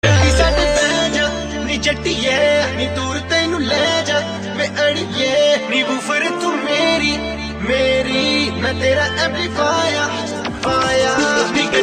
Simple Ringtone, Punjabi

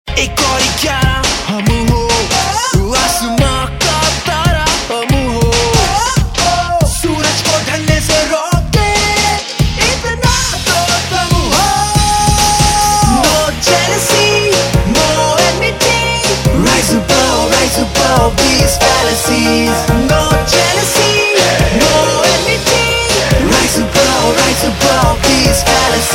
Title Tune; TV Serial Tone; MTV; Warrior High

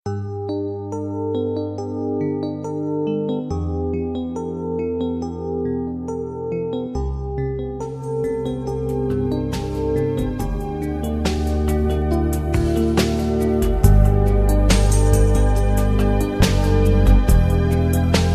Valentine Slow Tone; Valentine Tones

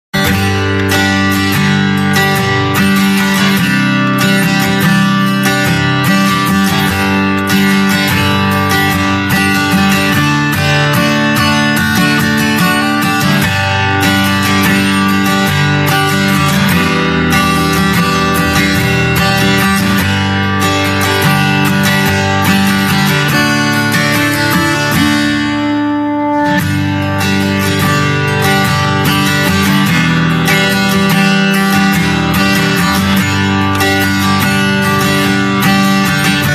Guitar; Muskaans; TV Serial Tone; Sony

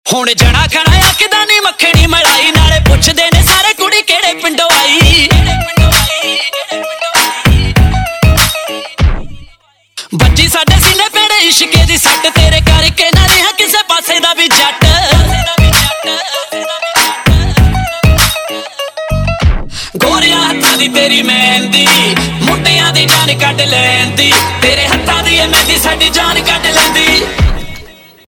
Ringtone, Punjabi Pop

